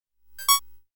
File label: pc bleep; Software Explosion; Fore-Matt Home Computing